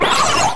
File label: scrm; The Elder Scrolls III - Morrowind